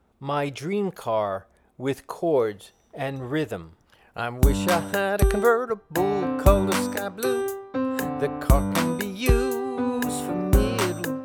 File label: with Chords and Rhythm; My Dream Car